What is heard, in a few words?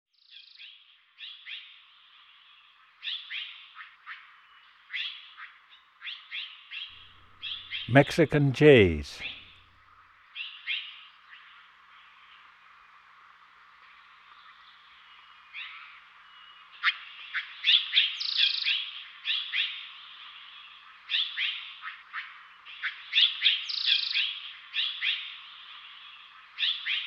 Mexican Jay; Western North America; Dan Gibson; Wildlife Identification By Sound